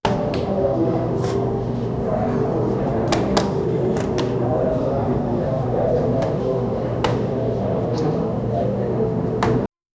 the sound of a busy, cough

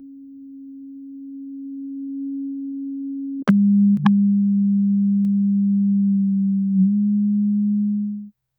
sound for, electric car

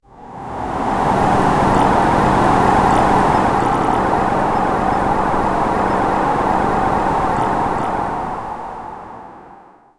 sound of the wind that

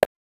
ui button tick

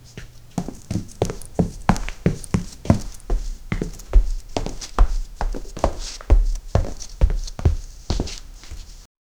walking on wooden floor, indoor

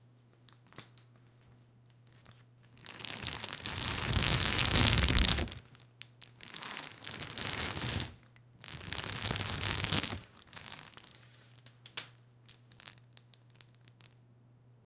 bandages wrapping

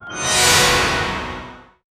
epic anime level up sound

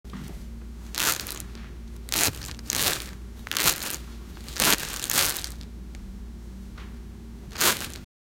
punching bag sound, boxing

